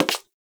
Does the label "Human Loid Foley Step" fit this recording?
Yes